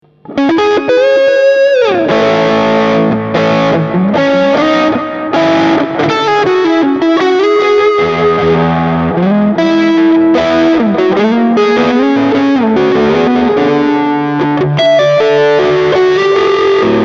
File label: Mooer GTRS Guitars Standard 800 Pop Emotional Solo